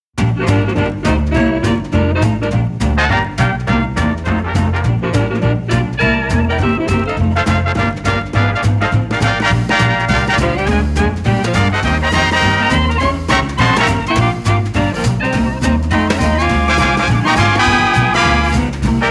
Helmet Bump Big Band; Hardspace: Shipbreaker

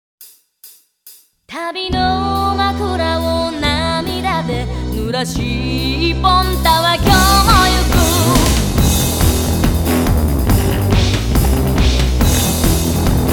Guitar Minus Track; Guitar Freaks V & DrumMania V; Musician's Room Jam Collection

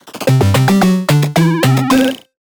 (Sfx) Retro Win

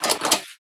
Sci-Fi Weapons-Loading Fx

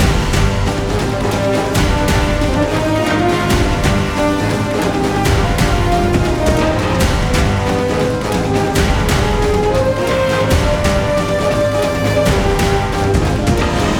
stun loop intense

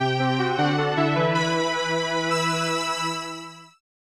Fanfare; Tinhead; snes; Microprose

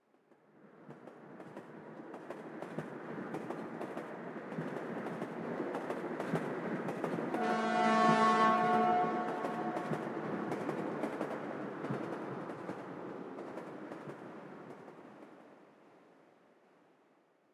Train

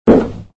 Item Sound; Extra Lives

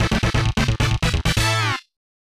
Power Up!; Dragon Ball Z RPG; Legend of the Super Saiya-jin; SNES

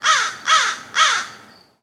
crows

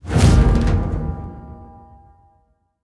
Drum; Chrono Ark

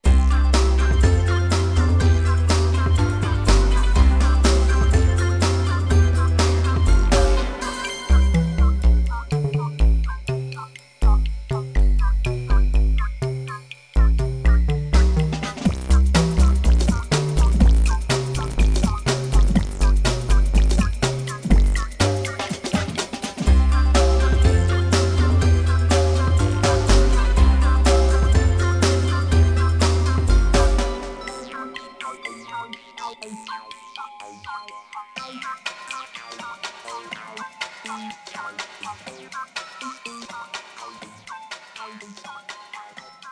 scrolling playground; lego my style preschool

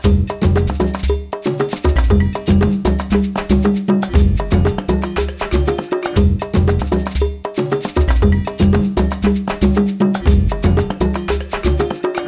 Rumba Dancing loop

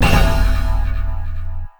The Curtain Falls (Game Over)

